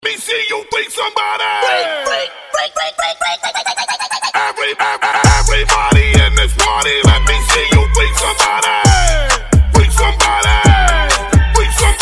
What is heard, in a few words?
Funk Acapella Intro 133Bpm; Dj Tool